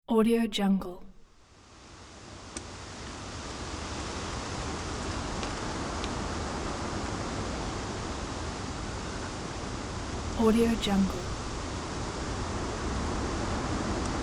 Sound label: gust wind